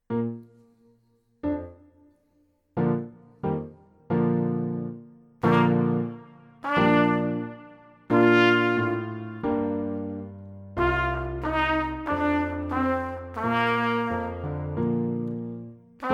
Trompetenfibel Hop Hop Hop

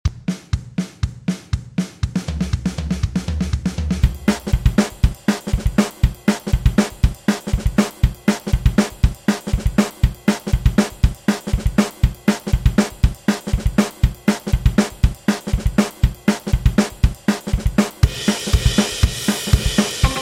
Ranzenrhythmus 120 schnell

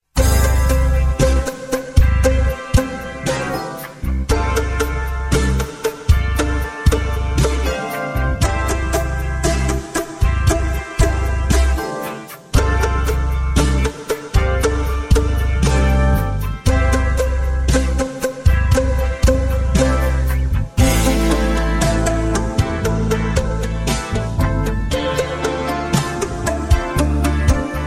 Boomdong langsam

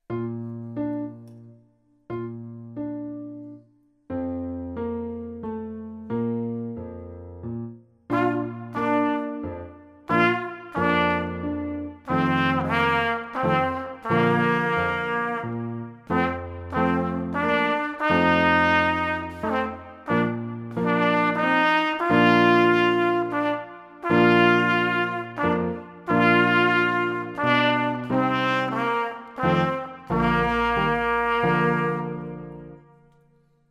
Trompetenfibel Kuckuck

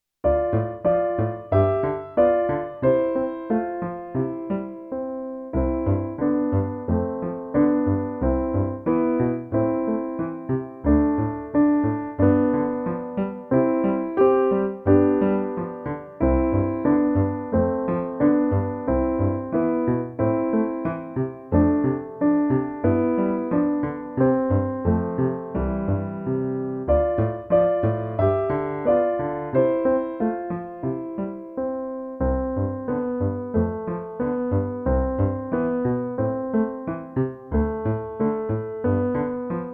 Amerikanisches Volkslied in C

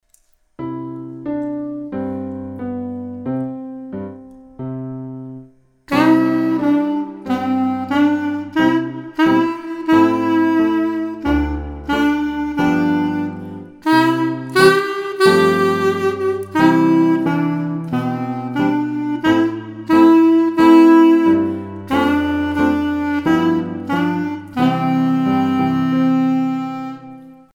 Clarineo Amerikanisches Volkslied

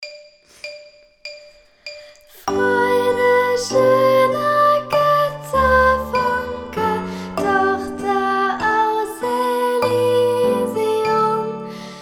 Mitspiel Uebesatz

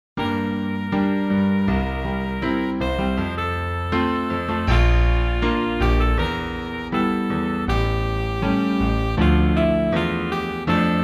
Ach ich hoffe lieber Josef mit Gesang

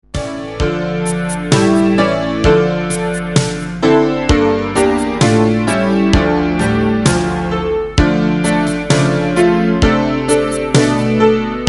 Schneemann Playback

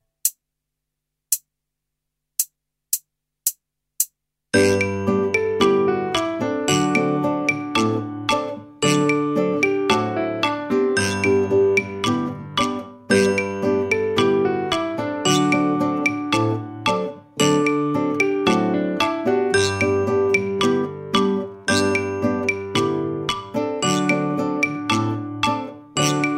Mitspielsatz

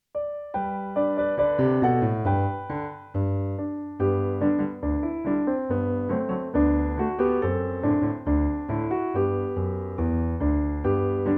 Marsch in C

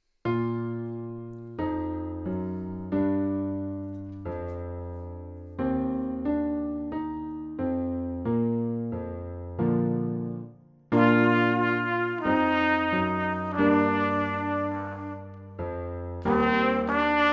Trompetenfibel Summ summ summ

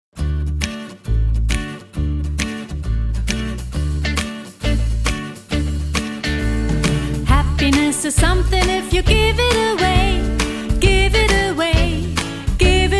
Happiness mit Gesang

